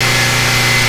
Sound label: Engine Constants, Transportation; E-MU Producer Series Vol. 3 – Hollywood Sound Effects